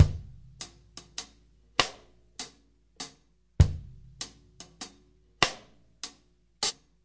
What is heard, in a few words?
six eight Loop, Six-Eight Loops 100 to 105 bpm, DRUM LOOPS and BREAKS